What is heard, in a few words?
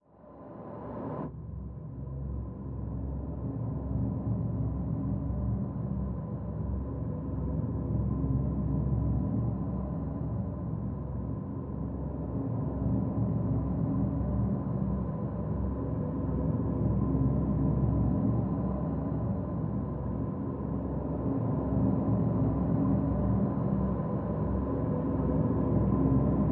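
drone simple B; Drn Bleeding Out Simple B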